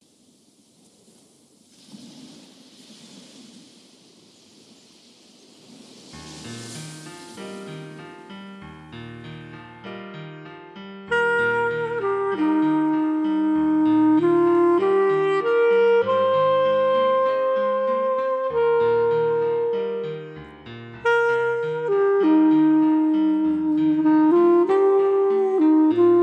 CLARINET

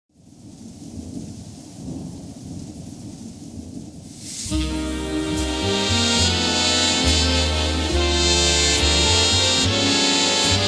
Big Band

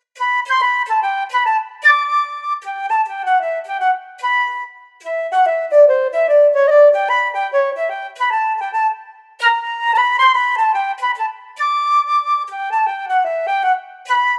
WALTZ FLUTE

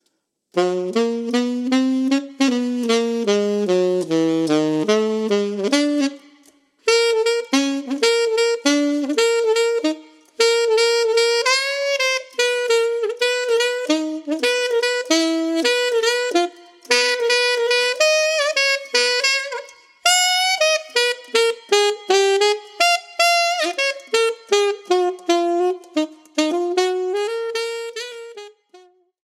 alto sax

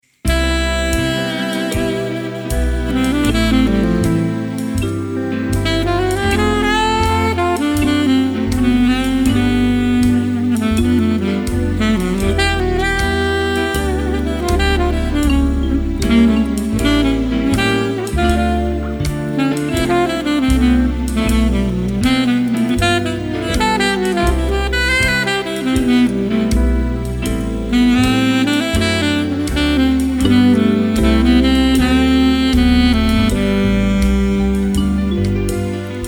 Swing alto

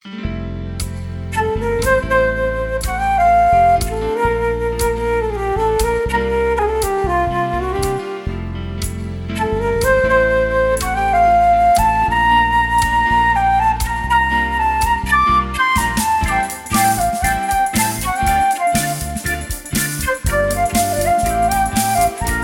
Flute

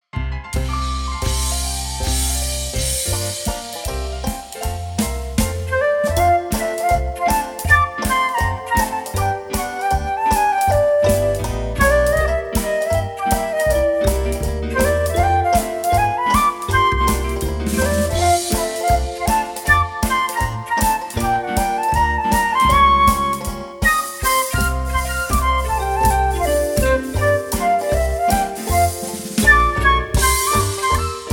flute

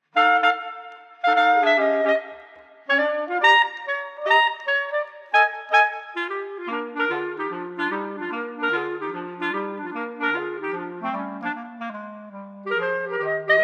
boogie Woogie Clarinet Trio

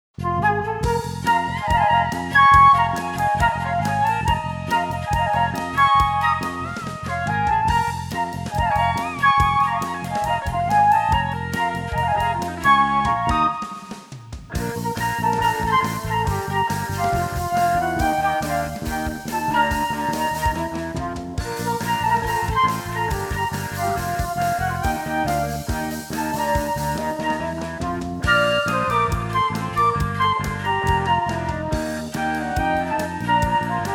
FLUTES WITH RHYTHM SECTION

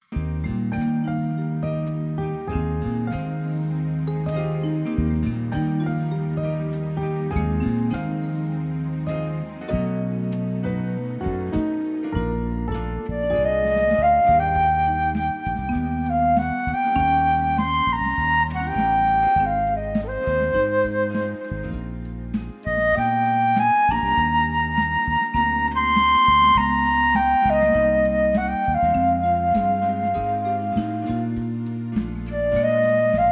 Flute

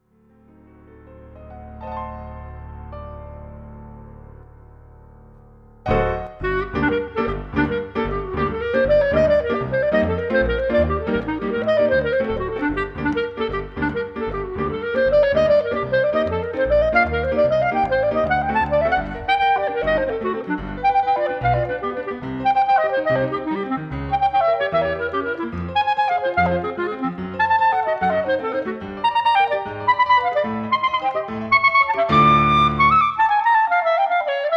Clarinet Sonata Clarinet

